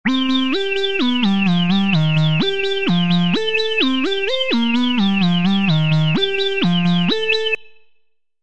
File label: 128 bird synth, loops